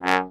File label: Trombone staccato F#1; Orchestral; DirectWave; Varazdin Orchestral